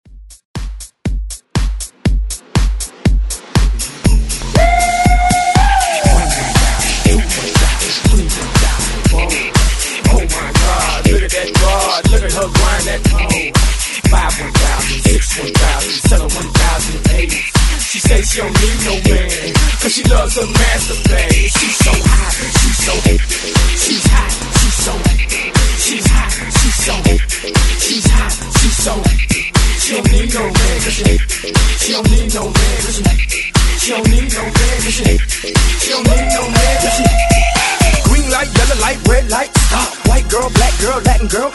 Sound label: Scratch ReDrum 120 Bpm; DJDOLLS